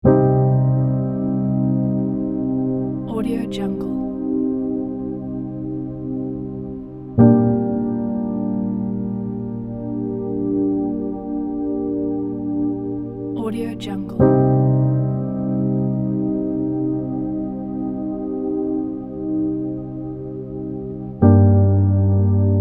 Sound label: dramatic dreamy piano